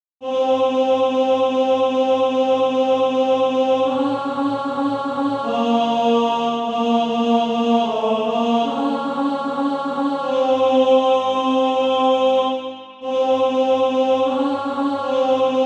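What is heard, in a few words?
alto; SATB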